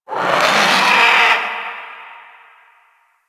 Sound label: Drifter Camp Vulture; Ambience; One Offs; Birds; Warframe; Quests; The New War